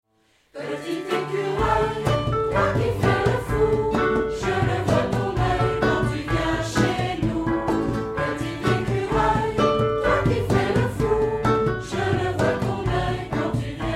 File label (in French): Petit ecureuil extrait version chantee